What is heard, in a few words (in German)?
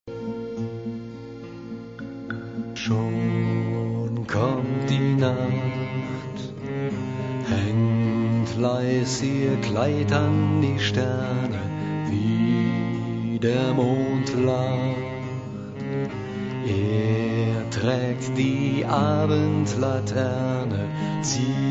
Kretisches Wiegenlied